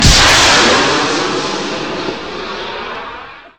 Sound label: missile motor fire